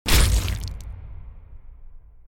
Death Burst Large; The Binding of Isaac

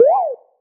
Jump

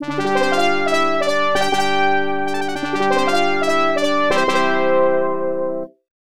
Fanfare; Zavas; X68000

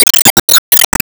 Enemy Iceberg Crystal Attack Hit

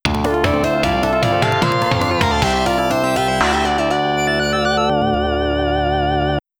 Stage Complete FM Synth

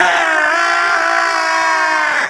Aaa Electroshock; Laundry

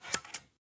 Boss Scissors; Winkydink

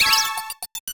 PLAY TRAMPOLIN

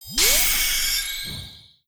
Phase Player Turn

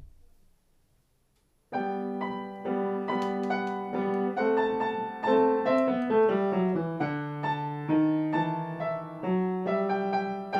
Waltz, Soundtrack for Piano

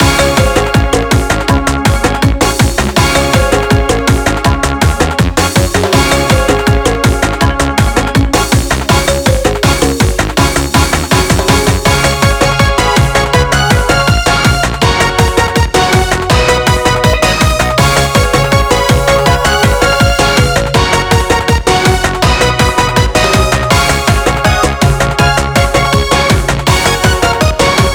Bgm Bomb; Mahjong Fight Girl (Arcade) (gamerip) (2023)

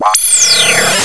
spaceship; MS-DOS, Windows; the time warp of dr brain; macos msdos windows

